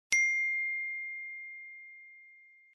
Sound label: ding ringtone; iphone 5s